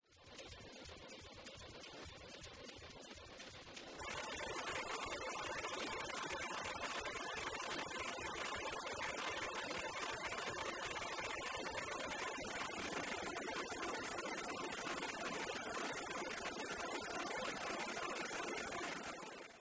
Boss DE-200 Digital Delay Sync Juno-6 Beat Overdubbing